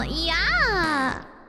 yaaaa fart

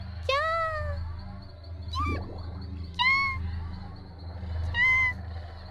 kya kya kya

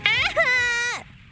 death noise; IRyScream

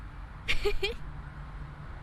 cute giggle

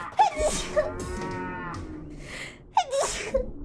Sneeze

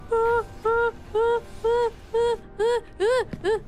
scared noises

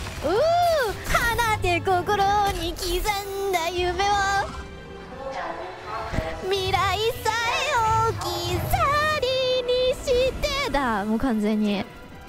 SINGING; IRySinging

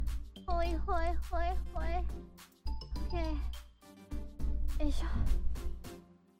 noises

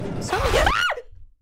Scream; so many; IRyScream

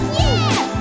YEAAAH; IRyScream

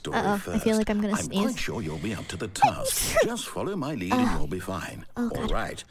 Sneeze

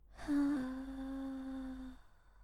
sigh

